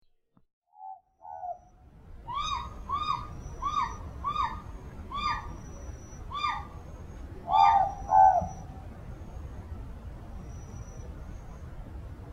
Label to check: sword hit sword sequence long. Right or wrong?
Wrong